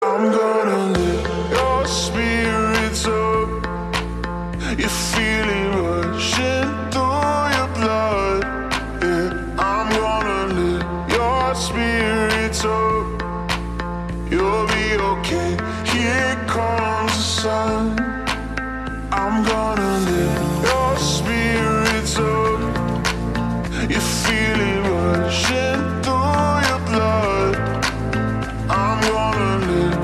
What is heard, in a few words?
slowed reverb Ringtone